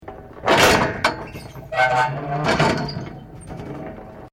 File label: METAL PIVOT LEVER IMPACT SQUEAK